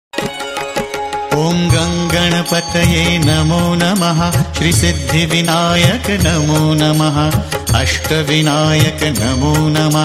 shri ganesh mantra

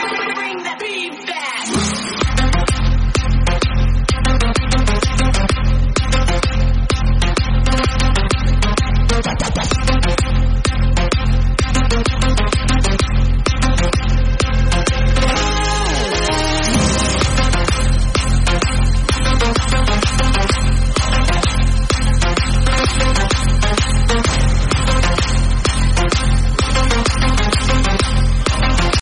edm mix

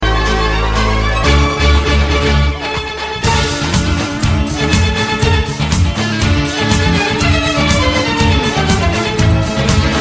victory

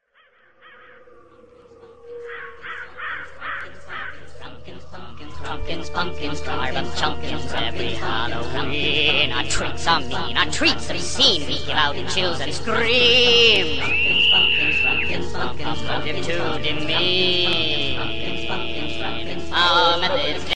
electro

